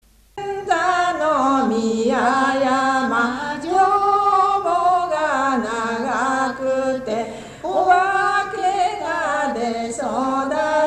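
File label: lullaby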